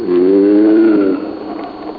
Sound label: alligator; creatures